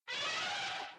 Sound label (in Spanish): ELEFANTE, jungla; mekanta